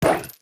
Wolf Lunge; Wolf Link's lunge attack

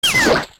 Die; Deku Scrub; zelda; OOT

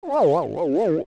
Cry; Goron; zelda; OOT

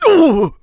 Sumo Slapped

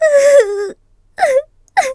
Cry; Princess Styla crying

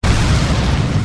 Goron Link Roll Fast Start; Kicking it up a notch

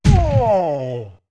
Big Fall; Goron Link landing from a big fall

